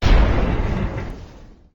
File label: structure blunt; Damage; Barotrauma; Subsurface